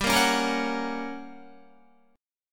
G Minor Major 7th Flat 5th G, Guitar, 4F, 4A, 5C, 5G, 5C, 6E; strummed; 14,13,13,15,13,14